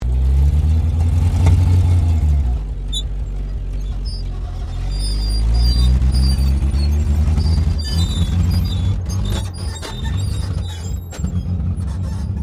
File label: APC FV603 Saracen Manouver Slow, British Saracen Light armoured vehicle; Trucks; Vehicles; SoundEffects-Foundation1